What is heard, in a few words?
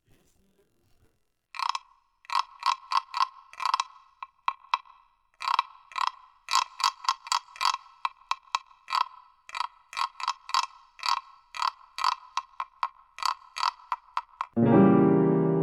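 speech therapy vocal warm-up backing track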